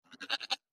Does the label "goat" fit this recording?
Yes